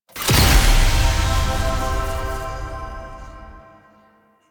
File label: Friendly Win; Warframe; Nineteeen Ninety Nine; PvPvE